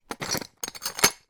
foley glass broken bits movement in plastic dustpan; zapsplat